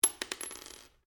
Cartridge 22 Caliber Long Impact Polished Wood Stereo; shell casings of different calibers impacting on different surfaces; SoundEffects Foundation2